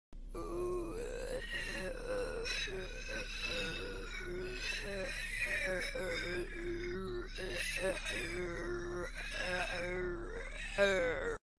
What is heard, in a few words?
zombie; ZombiesNight